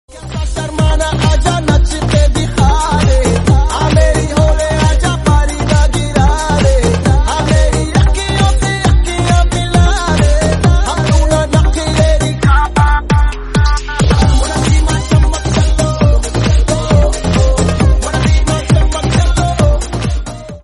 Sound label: remix